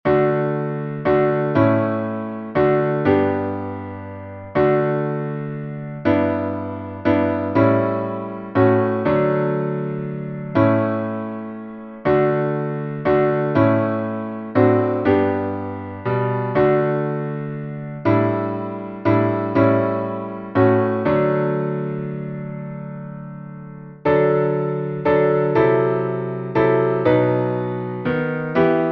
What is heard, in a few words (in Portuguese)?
salmo instrumental